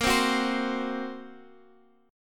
A sharp Suspended 2nd Sharp 5th A sharp down Guitar Standard; strummed; JGuitar